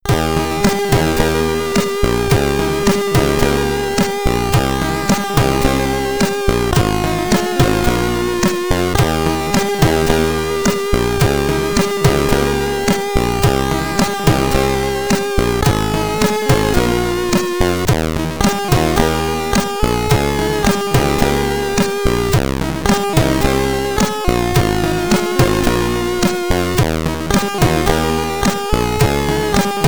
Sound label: Code Entry (SID Stereo); steg the slug; commodore 64